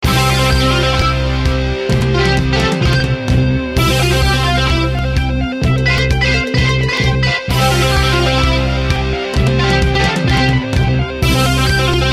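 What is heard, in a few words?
metal loop; Strong Bad's Cool Game for Attractive People; teen girl squad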